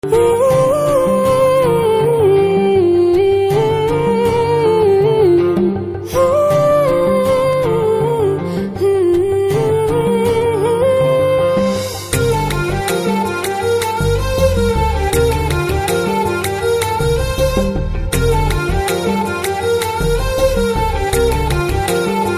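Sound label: background Tone Telugu Ringtone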